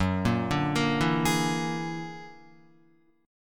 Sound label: Gb Augmented 9th Gb down Guitar 4F,4A,5C,5G,5C,6E; Gb+9 arpeggiated